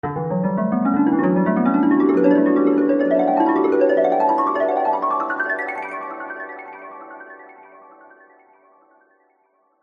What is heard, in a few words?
sound design heavenly dreamy glissando